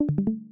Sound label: stream user joined; backspace